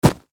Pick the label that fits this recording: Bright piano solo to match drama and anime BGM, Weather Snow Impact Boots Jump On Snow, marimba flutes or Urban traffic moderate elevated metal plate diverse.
Weather Snow Impact Boots Jump On Snow